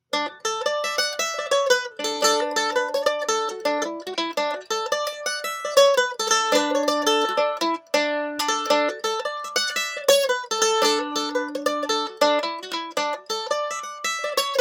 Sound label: Mandolin Pick Comparison